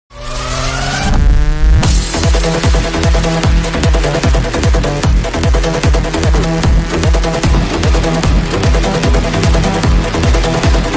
bgm malayalam ringtone bgms; mammootty

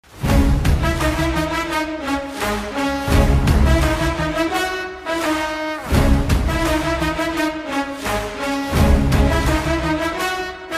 telugu music; Devara; NTR

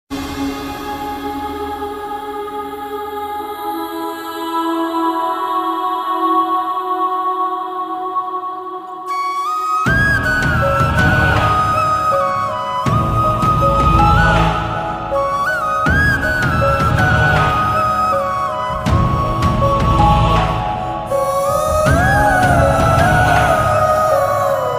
imotional music; Ruposh movie

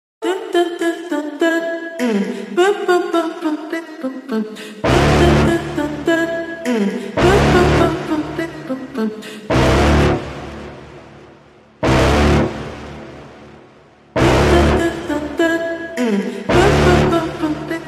bgm ringtone; irritated abrar

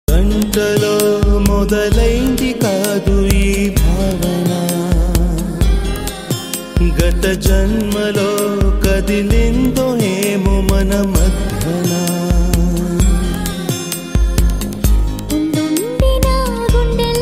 telugu love songs

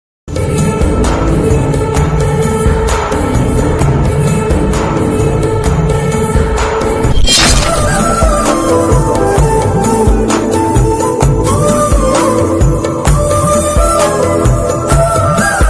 love feel bgms

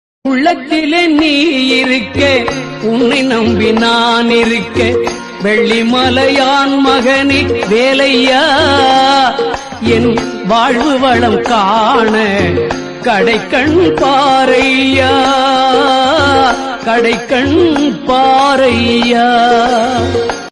tamil murugan bhakthi padal murugan ringtone

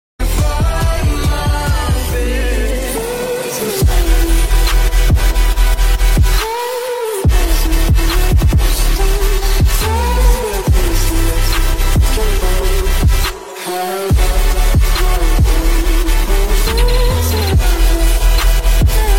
remix ringtone

